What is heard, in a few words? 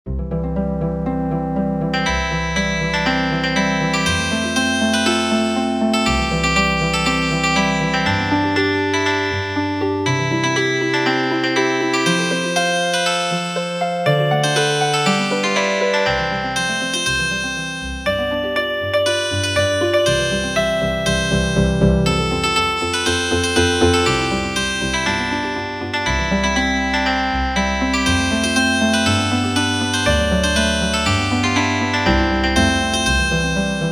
Healin Harp